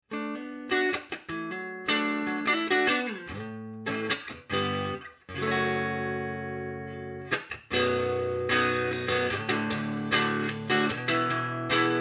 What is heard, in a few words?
clean